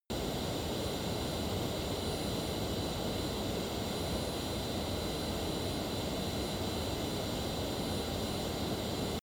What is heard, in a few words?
military jet fulcrum turbine idle direct